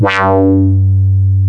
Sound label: WAH; East West; Global Sounds